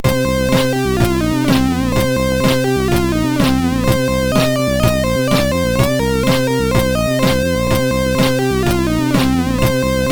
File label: sid MOS 6581